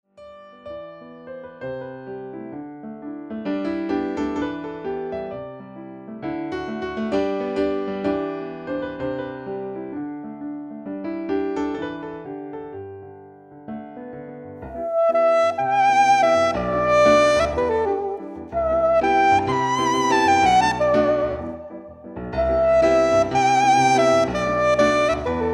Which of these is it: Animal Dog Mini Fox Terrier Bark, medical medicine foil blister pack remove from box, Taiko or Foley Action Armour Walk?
Taiko